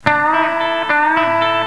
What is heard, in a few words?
bending, guitar